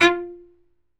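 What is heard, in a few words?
F3 SPICCATO, CELLO; Northstar; New Gold VOL-2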